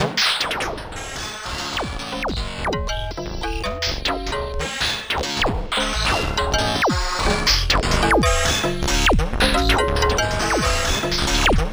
LOFI RAVE, SynthLine; 90 s Sample CDs; NiceBeats; SynthLine VOL-2

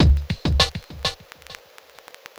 drbreak, drumbreaks; Masterbits; Vinyl Attack

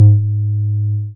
BAS MINI, Mini-Moog

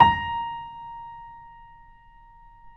BOSENDORF

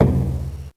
Perc Wadaiko Casio SA-1

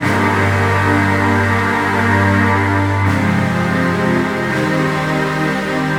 Strings Darktron; Sonic Foundry (Sony Creative Software); Knutrix Drum & Bass

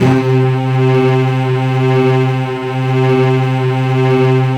STRING PIZZA; SONIC ELEMNT; SONIC EL YES

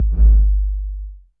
SFX K808; Masterbits - Soniq Elements

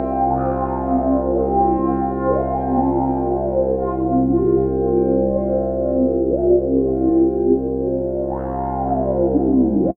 Dreamy Drone LFO C 120, Ambient; Trance Formation

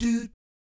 DOOT A#3, Jazz; ILIO; Vocal Planet; Jazz & FX; JAZZCOMBO

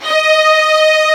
STR STRING, Orchestral Strings

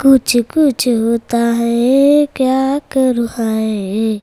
CHILD VX C, Indian Traditions; USB Soundscan vol.45; AKAI

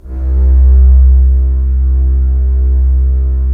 STR CBS, Roland LA Composer

